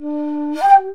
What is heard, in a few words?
SHAKU FALL; Midjwiz & Shaku